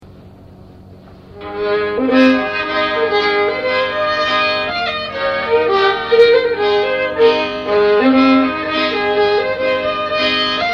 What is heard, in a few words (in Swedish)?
Polska